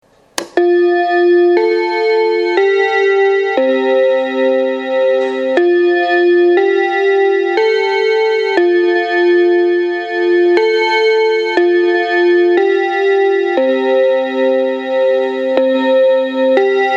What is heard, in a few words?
Seiko Westminster